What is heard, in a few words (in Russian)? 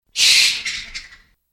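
крик летучей мыши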